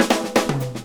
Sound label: FILL, Grooves Filz Hitz; PlatinumPhattCD1; E-MU Producer Series Vol. 8 – Platinum Phatt